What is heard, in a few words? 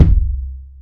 roomy kick drum single shot c key